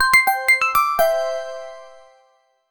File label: bright energetic, bubbly